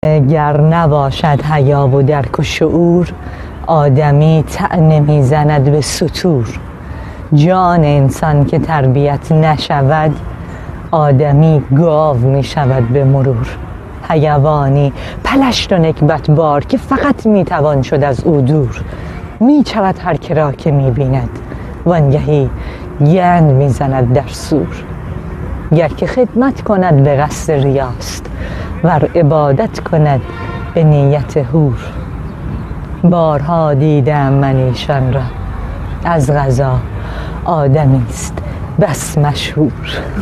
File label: Cow, Guitar